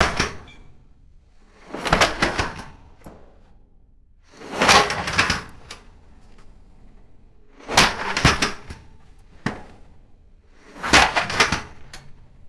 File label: fiberglass ladder set down on cement